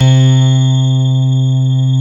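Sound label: grand piano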